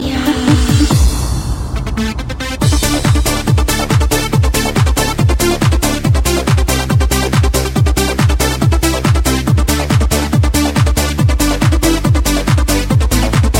Techno

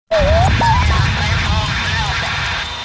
Disturb Radio

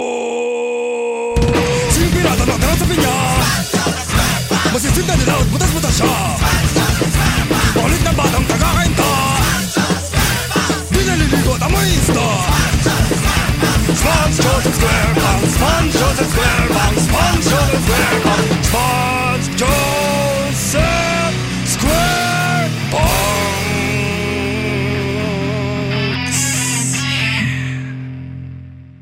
Heavymetal; Spongebob

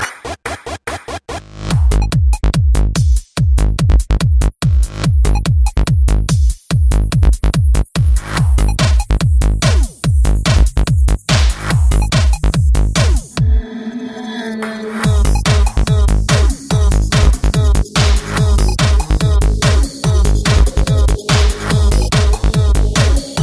Electro; Eskimo

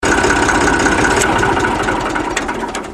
Tractor Stop; Mf 35

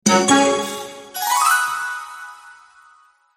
Sms Melody

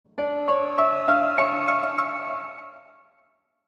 creepy piano sound

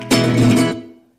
riff

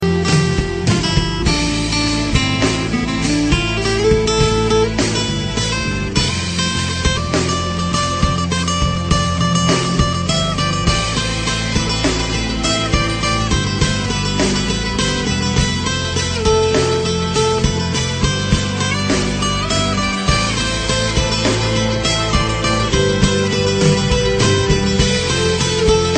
Rock Vs Guitar

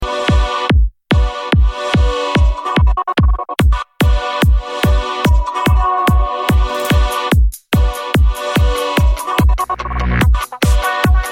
Crazy Beats

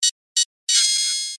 Robot Sentry Armed

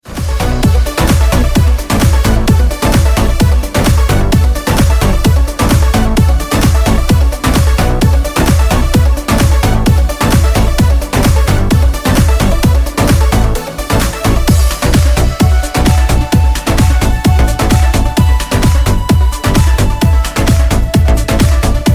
Flute Beats

